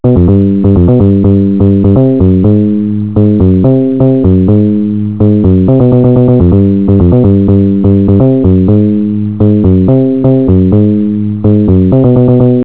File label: tune